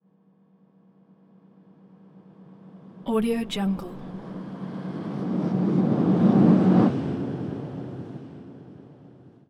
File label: science fiction doppler whoosh doppler disappear; futuristic